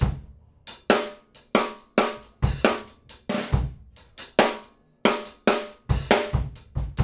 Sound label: GROOVE, 60-85bpm; E-MU Producer Series Vol. 8 – Platinum Phatt